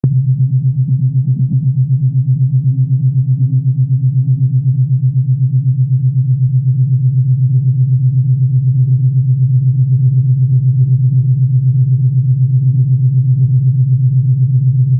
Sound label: futuristic ufo humming; aliens